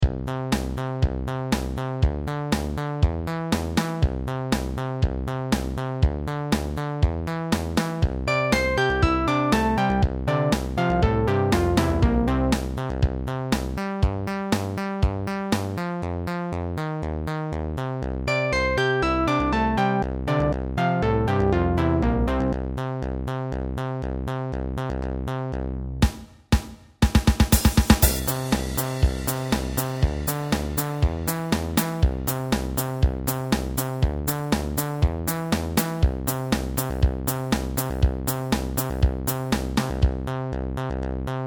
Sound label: instr